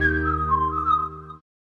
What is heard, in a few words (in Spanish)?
silbado